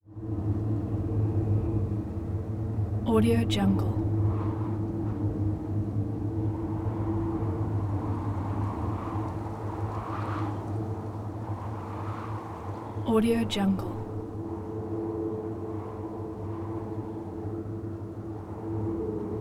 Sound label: tube wind deep airy ambience tonal haunting